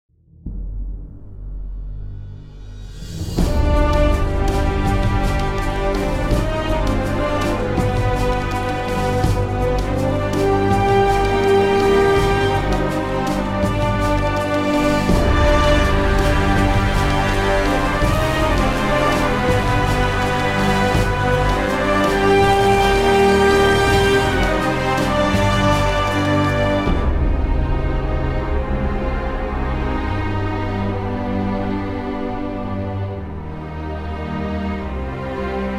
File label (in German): Music Arabisch; rise of venice windows gamerip 2013